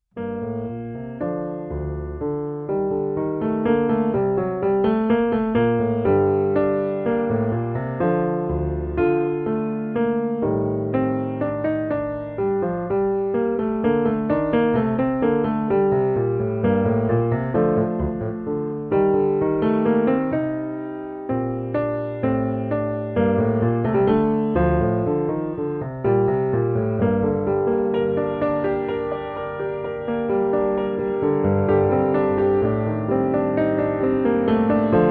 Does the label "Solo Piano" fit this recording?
Yes